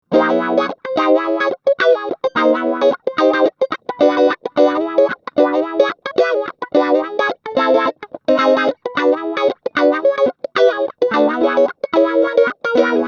Mooer GTRS Guitars Standard 800 Funk Reverse Ambient